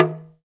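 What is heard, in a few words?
talk drum, 1C; TALK DRMS; Spectrasonics; Heart of Africa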